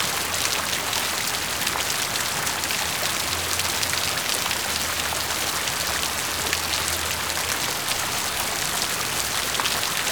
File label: water pipe splash